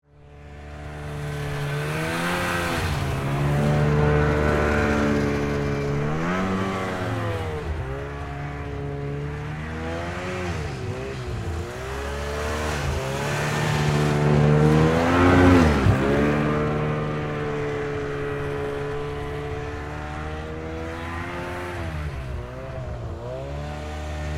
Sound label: Snowmobile Activity, Sports, Snowmobile, Activity, Yamaha, Exciter, 440; Miscellaneous Sport; BlastWave